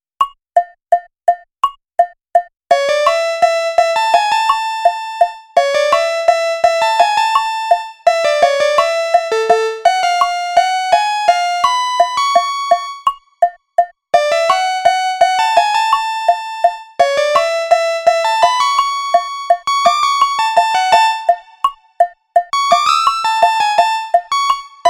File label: 8bit love melo